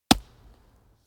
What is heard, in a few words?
catch; butterflysoup2